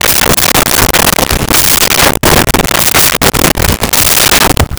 Vehicle Door Town Car Int; Vehicles; Doors & Keys

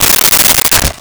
Book Page Turn; Office Supplies; 1001 Sound Effects

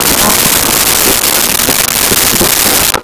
Tune AM Radio; Communications

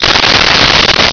welding torch

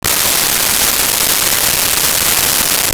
hair mousse; Bathroom

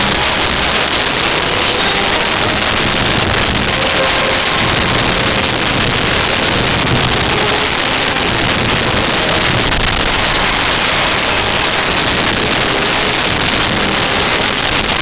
boss loop, Music

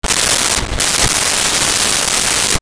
deodorant spray; Bathroom

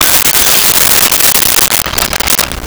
Pickup Dishes Tablecloth; Vintage Cartoon; Foley

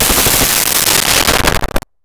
Machine Gun Bursts; Weapons & Explosions

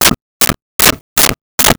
Nail Hammer into Wood; Tools; 1001 Sound Effects

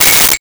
Metal Clang

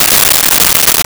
Paper Wadded Up; Office Supplies

